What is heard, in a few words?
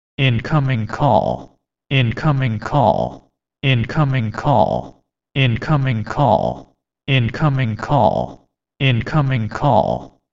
Incoming Call